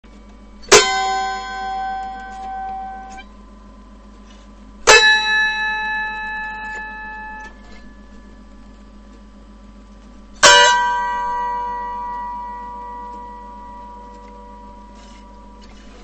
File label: harmonics, autoharp